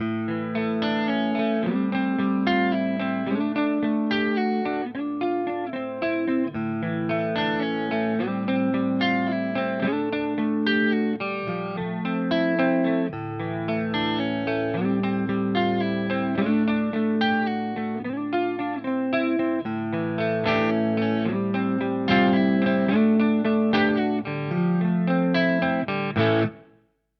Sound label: Epiphone Prophecy Les Paul Clean Neck Modern